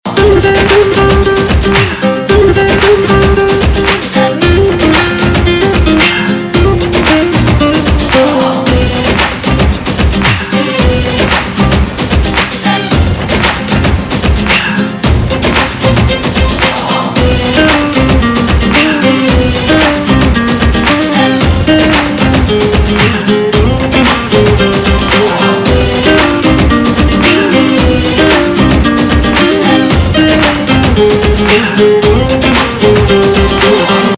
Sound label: Instrumental Ringtone, Bollywood; Befikre